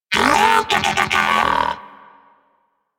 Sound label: Moa Pet Enemy Killed; Warframe; Pets; Tenacious